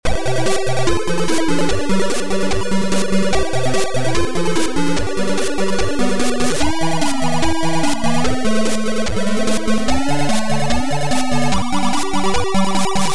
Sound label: Army style intro